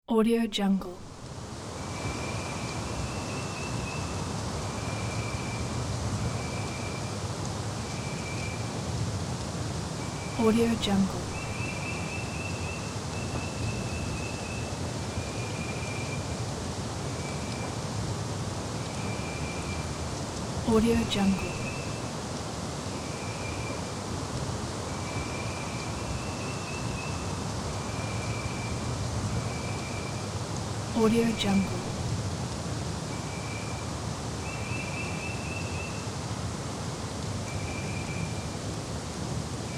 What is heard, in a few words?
wind in forest